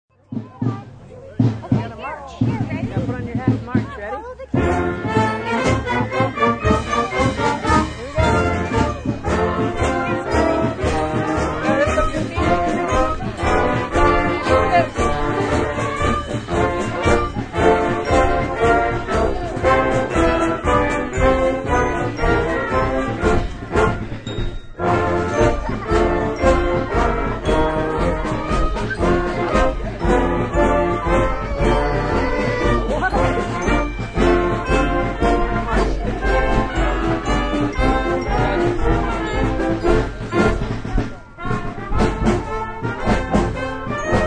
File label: Mrchng Bnd, Band perfomance.; Marching Band; Parades; Backgrounds